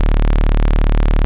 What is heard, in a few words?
BUCHLA, Rare Synthesizer; BUCH.D2-20; Hollywood Edge; Giorgio Moroder Rare Synthesizer Collection